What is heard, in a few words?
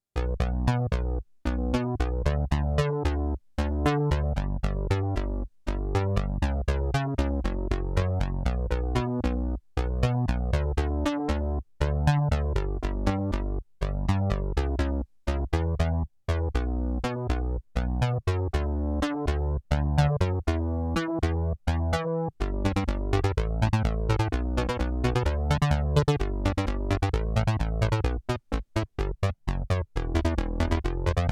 BASS, SIEL DK 80; Factory